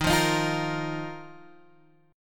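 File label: E flat Suspended 2nd, Guitar, Open G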